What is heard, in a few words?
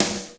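hard snare drum, Roland JD-990; Drums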